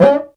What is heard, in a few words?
CUICA LO